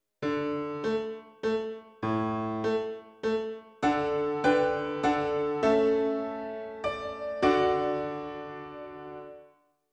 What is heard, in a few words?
Piano